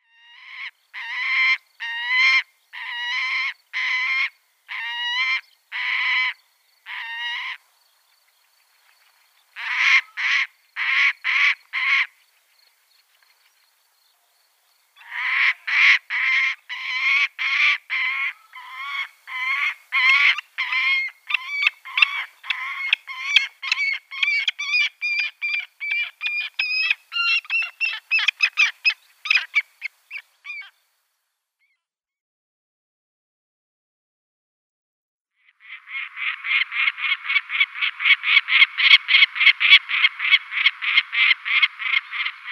Lanner Falcon

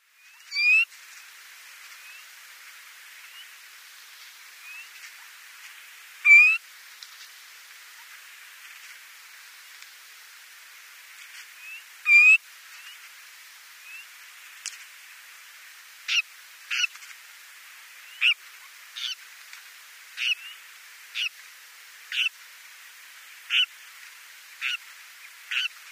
Bar tailed Lark calls

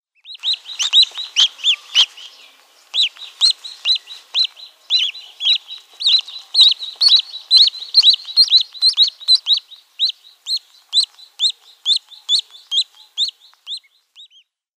Mallard downy Young

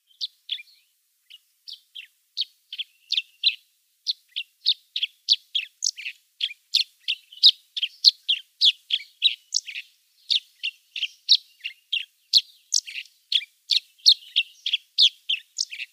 Chestnut-shouldered Petronia song

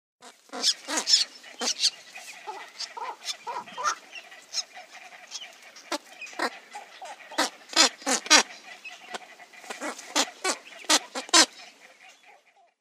Squacco Heron

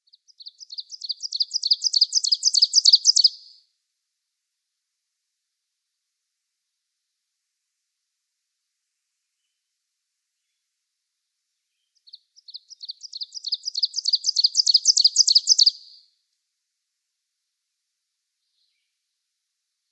Ovenbird song

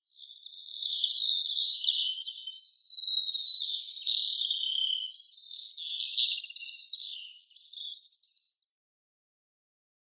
Dunnock calls

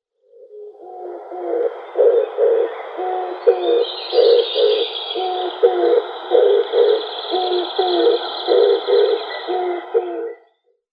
Oriental Turtle-Dove orientalis male display

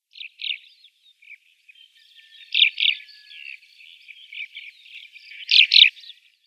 White-winged Lark calls

